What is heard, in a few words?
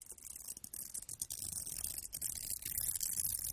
Velcro is pulled apart at various speeds. Tear, Velcro Rip, Velcro